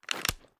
inspect xmag end; reloads; gmod

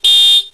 bike horn; gmod; sligwolf; base

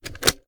pi reload empty fast magout; gmod

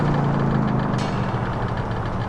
throttle off slow speed, vehicles; emperor

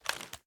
ar reload empty rotate; gmod; viper

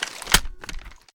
reload start fast rotate; gmod

